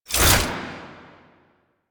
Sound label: Whoosh B, weapons; Warframe; Gunsen Prime